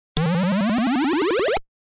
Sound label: pick Up Mate; Frogger; Arcade